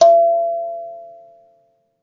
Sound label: KALIMBA F#5; ILIO